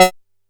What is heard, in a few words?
F#4, Bass; E-MU Producer Series Vol. 6 – Analogia Project; Boom da Bass